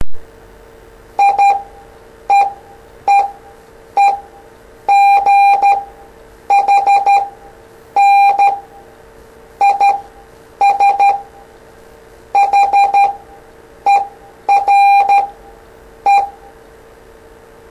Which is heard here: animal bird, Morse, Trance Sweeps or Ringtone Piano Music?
Morse